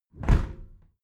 window close heavy

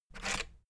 rotary control plastic rattle turn back

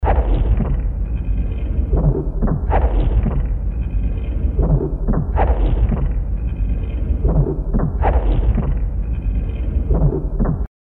noise pulse backing

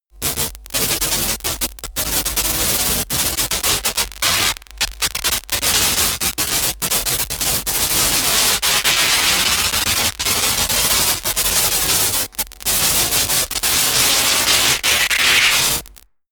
promo pad

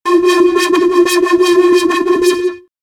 energizing event

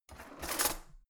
old drawer out half hard

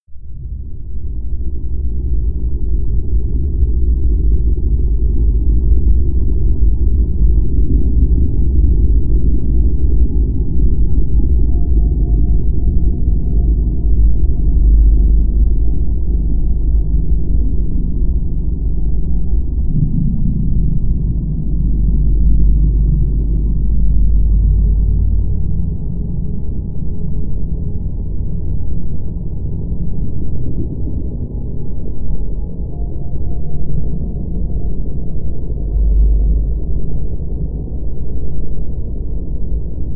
drone

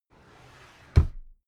drawer cabinet carton close regular

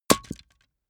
wood sticks small break metal small

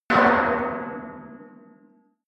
metal impact bar bell large

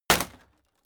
impact sweetener wood shatter hard

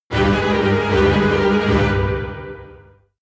jingle orchestra dramatic

